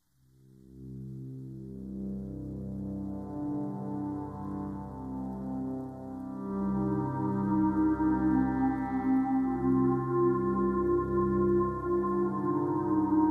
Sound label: birds